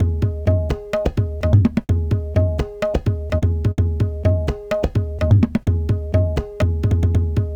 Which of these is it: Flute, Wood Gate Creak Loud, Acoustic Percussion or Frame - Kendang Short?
Acoustic Percussion